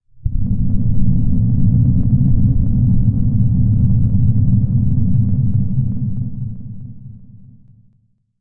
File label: funny subwoofer